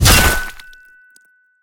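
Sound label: knight's sword hit the target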